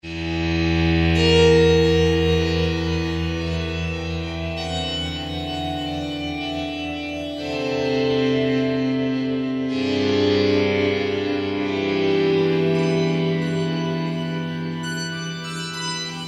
Piano Keyboards Synth; Yamaha A4000

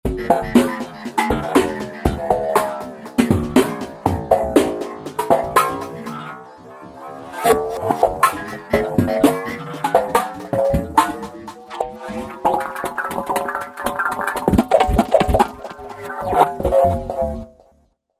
Micro Jazz, Roland V-Synth; Factory Patches

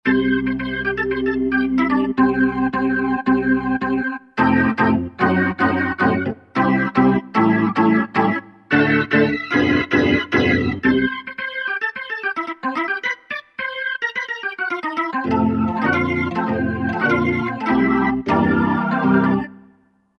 Organ 3, Roland JV-2080

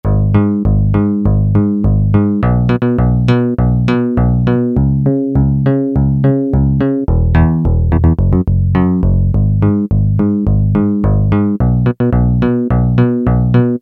BASS 1, Yamaha DX7

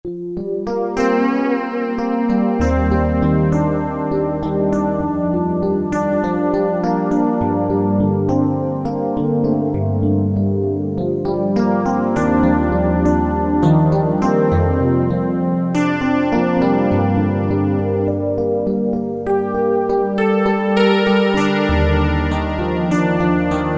Ring mod E-Guitar; Roland PN-D50-04

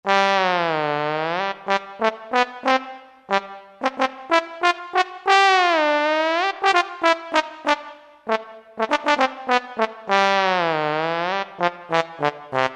Trombone Solo, Roland JD-990; Factory Patches

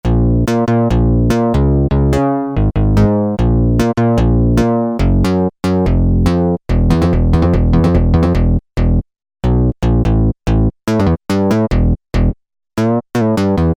MIDI Bass, Rhythm Section Instruments; Roland L-CDX-01 Rhythm Section Instruments

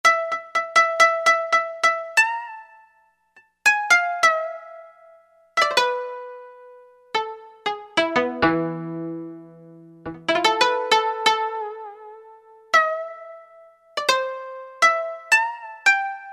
Koto Pluck, Alesis QS8; Factory Preset examples

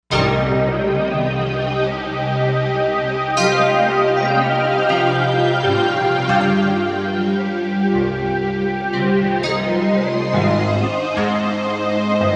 Wave Picks, Korg M1Rex; Factory Patches

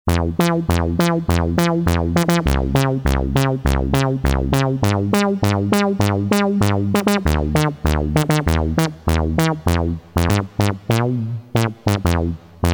Synth Bass; Guess the synthesizer game

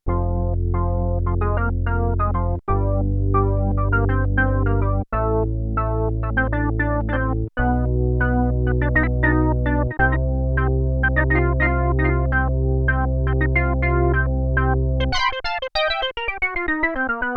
Organ, Roland JX-8P

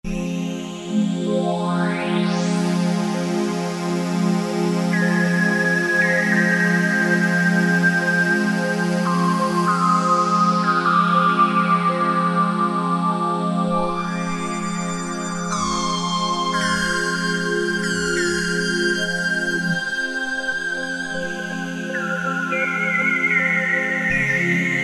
PadWorld, Alesis Micron; Factory Setups

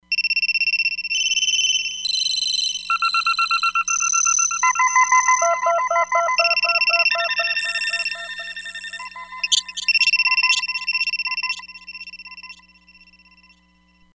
Mobile Phone, Roland Fantom XR

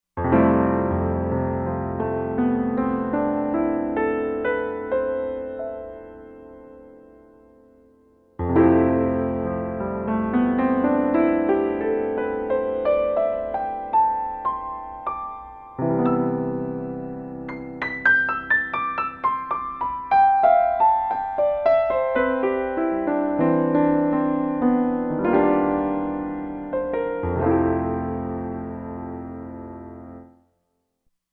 Ultimat Grand, Roland Fantom XR

